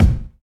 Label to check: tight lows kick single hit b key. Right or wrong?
Right